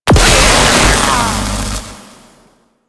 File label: Drone Destroy; Warframe; Entrati; Vaults